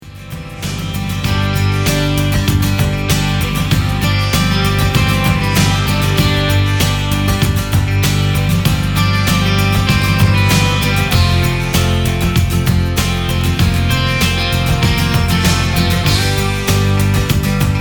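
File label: Rock anthem; Rock anthm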